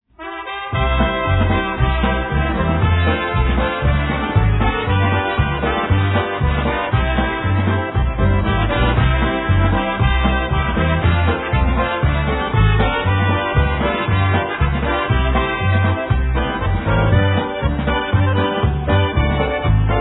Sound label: Golden Brass Polka